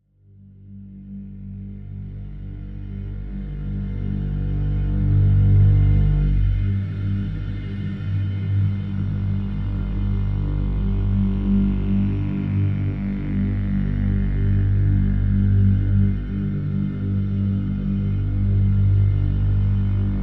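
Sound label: Drone Reactor G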